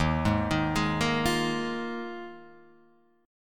Eb Suspended 2nd Suspended 4th, Guitar, Half Step Down